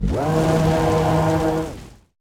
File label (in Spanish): Grito de Skeledirge; Gritos de Pokémon de la novena generación